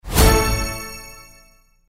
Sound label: hit; CandyStorm